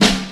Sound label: original snare b key